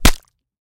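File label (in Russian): быстрый точный удар ножом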